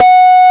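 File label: F#3 natural harmonic, guitar; AKAI S-Series CD-ROM Sound Library VOL-8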